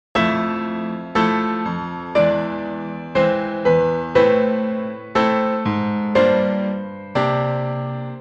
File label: melody